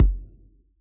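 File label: bass drum analog; AnlgKit1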